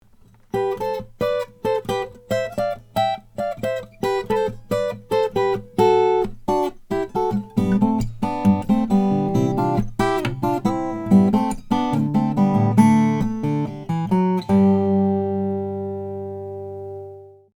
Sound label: 2005 laurie williams kiwi flame ancient kauri